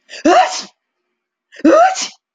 Human sounds Respiratory sounds Sneeze, vocal imitation